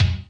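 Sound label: bass drum reverse, Kawai R50 drum machine; drum machines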